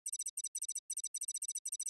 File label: ticker